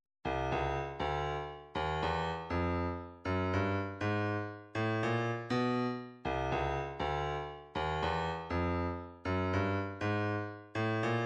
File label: 160 snare